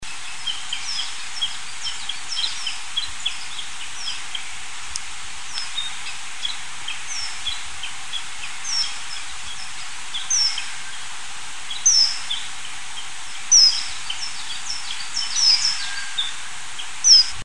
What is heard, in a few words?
bush warbler